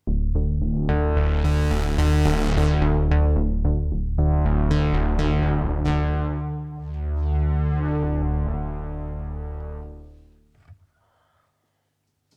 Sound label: Polybrute 12 Synth